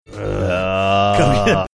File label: groaning